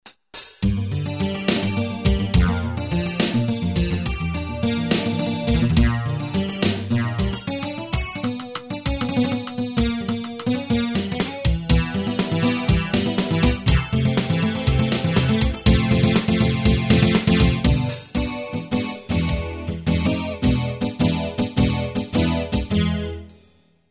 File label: steel hard guitar